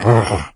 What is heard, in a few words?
melee; doom3; morgue